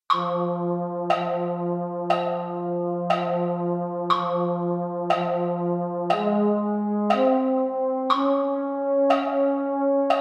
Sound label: baritone; concert corps